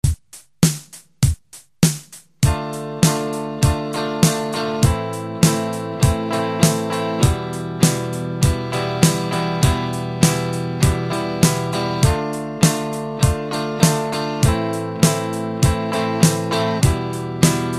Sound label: strum normal, guitar